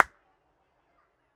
Hand Clap Sample, Neumann U89 omni; Regents Park

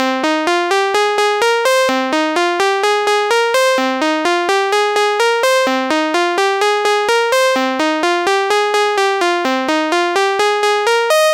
synth with cutoff

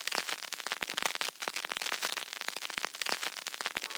Vinyl Noise; KSHMR

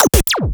Glitch Small; KSHMR

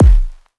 Vinyl Kick; KSHMR

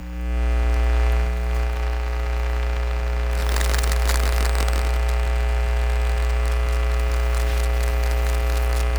Laptop; Antenna Passover

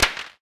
clap; sys100

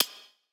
Hi Hat

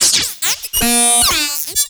Transformer FX; KSHMR

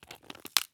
texture cabbage hits

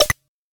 flash

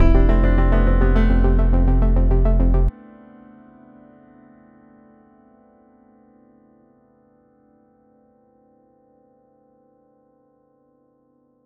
piano pattern on break slice for reversal

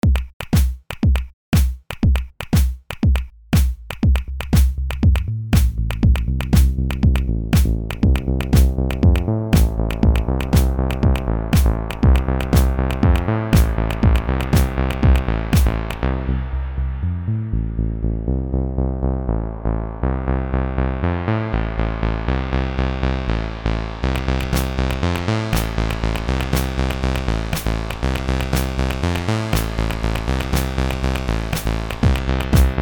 sample 120bpm

